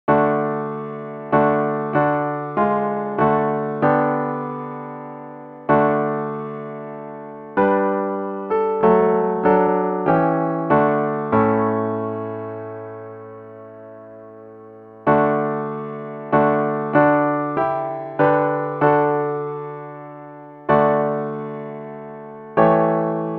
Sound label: CHORUS